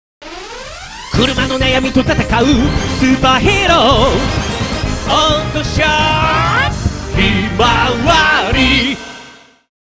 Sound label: soundlogo; Himawari